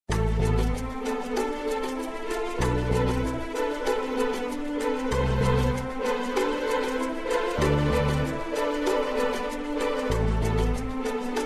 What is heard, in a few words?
Loading